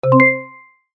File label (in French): ouverture